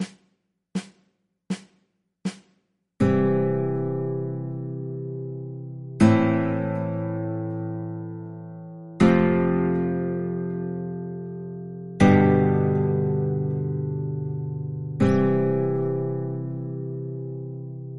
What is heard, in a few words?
chord progressions 50s progression